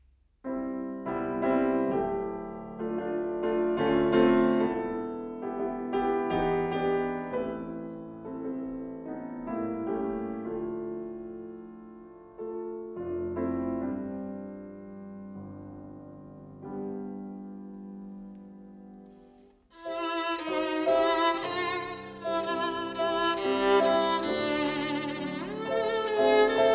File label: violin & piano